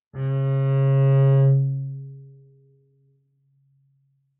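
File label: Bass arco fortissimo sul G Db3, Double Bass; MIS Pitches - 2014; Strings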